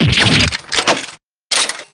damage

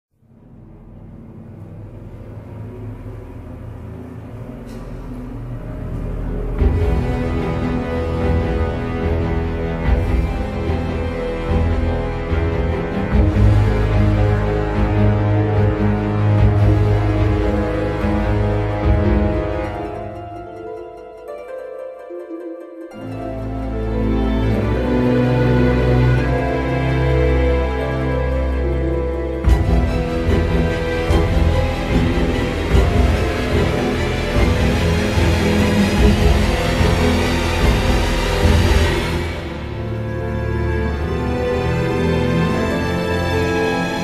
suspense; gambling

